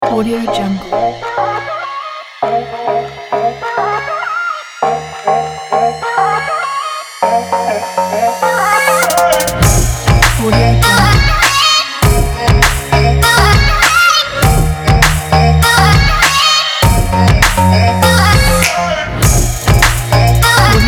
fashion big beat stylish vlog

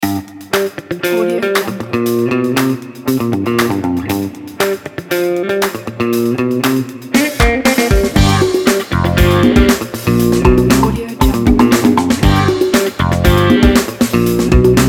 upbeat funky logo

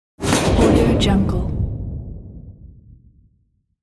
large door close

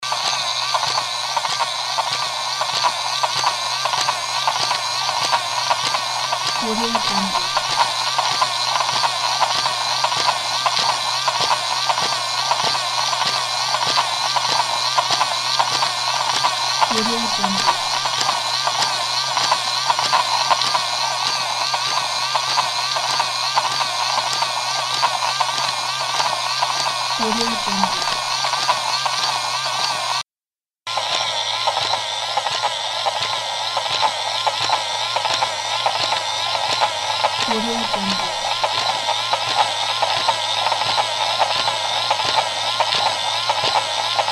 mechanical toy

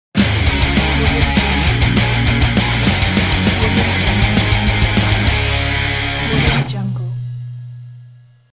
punk rock logo

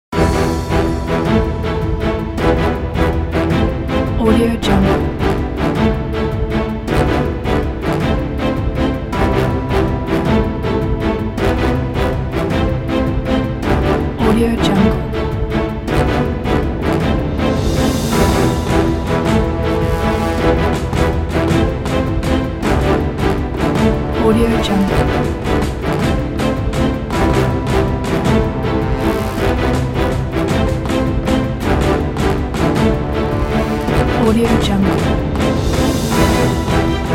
dramatic news theme

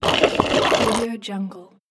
through straw slurping

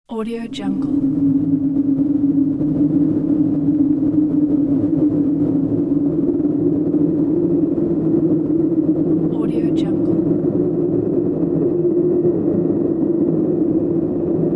blowing wind

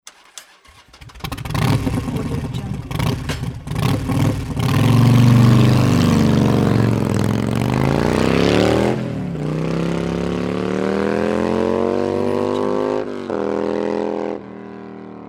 harley motorcycle start and drive away

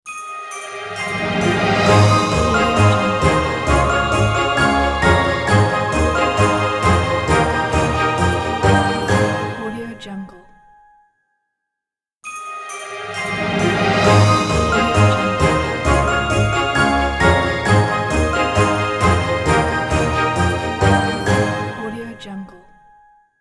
christmas intro

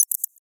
modular ui fm solo bleeps; soundmorph

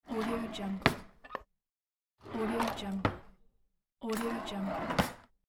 drawer closing

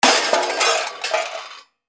junk thrown onto junk pile

